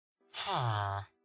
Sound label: Villager Sound Effect; Minecraft